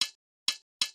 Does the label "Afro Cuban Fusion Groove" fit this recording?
No